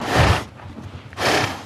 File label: Horse Breath Soft X2